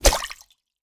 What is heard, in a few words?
Bullet Impact Water